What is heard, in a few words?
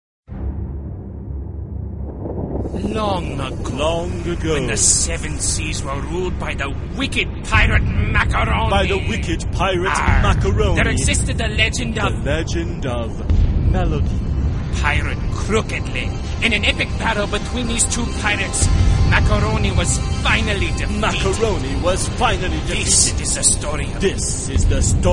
melody